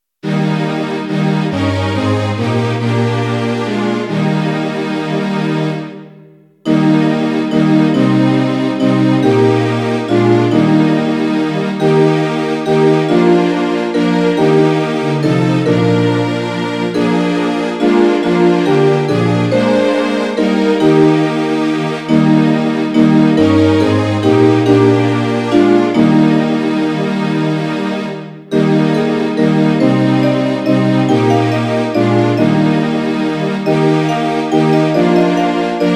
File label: Eb, hymns